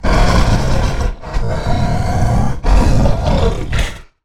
Stealth Breath Low Health; Evolve; Goliath

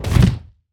Grounder Climb, Kraken; Evolve

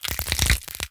Collisions Bodyfall; Lurker; Evolve; Gorgon

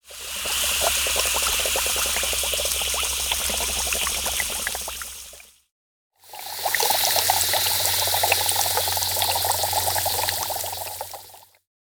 Acid Barrage Pool; Lurker; Evolve; Gorgon

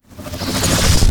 Lightning Strike Fire Ramp; Evolve; Elder Kraken

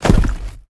Tongue Grab Pull Back; Evolve; Behemoth

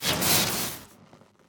Melee Impact Electric; Grounder; Evolve; Kraken

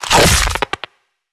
Ability Spider Trap Hold Start; Lurker; Evolve; Gorgon

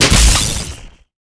Chain Lightning Impact; Evolve; Elder Kraken

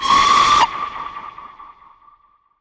Death Wall Fire; Evolve; Elder Kraken

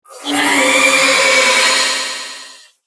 zoo bat

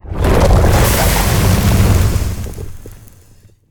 Seismic Blast Staircase; Evolve; Behemoth

